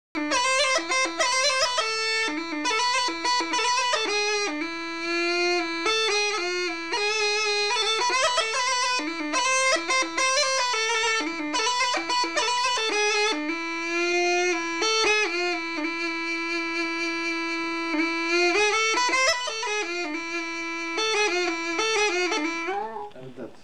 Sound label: F natural samples, gajda; Gajda Lesson